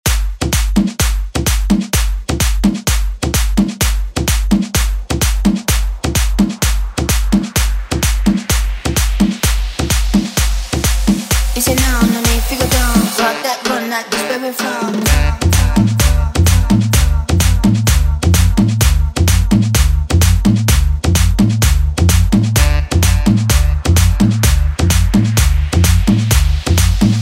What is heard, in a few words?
Guaracha; Deejay Hit